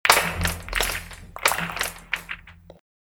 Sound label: Snow Ghost Pool Processed